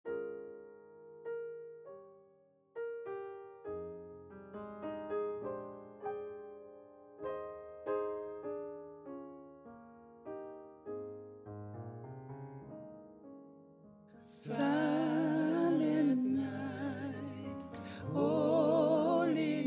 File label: Silent night Sopranos; Gospel